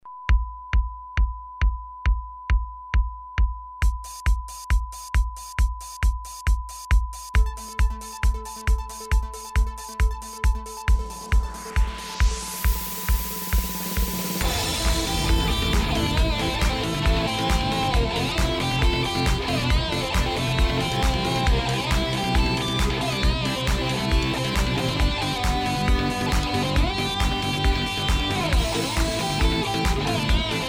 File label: music on guitar